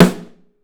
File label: Drums, STEEL; 3D Drums